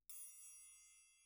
Perc

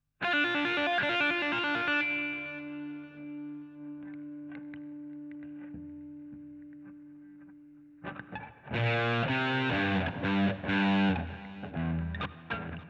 GTR A Solo

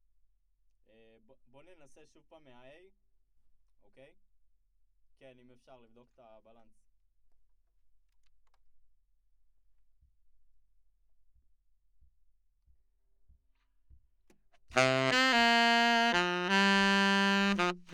Sax

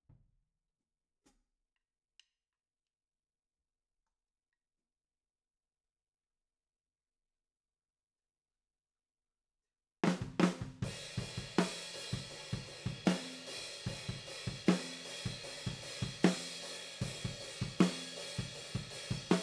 OH A

